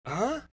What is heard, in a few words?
"huh"